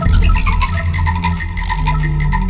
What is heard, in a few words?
Percussion Combos; M-Audio; Pro Sessions VOL-38; Abstract World Fusion 2